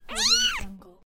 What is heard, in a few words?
kitten